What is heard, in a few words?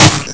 gun, weapons